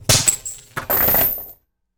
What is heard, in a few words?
break chains